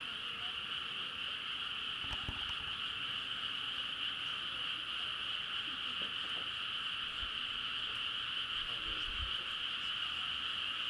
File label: sound bats or frogs quai gare, Fukushima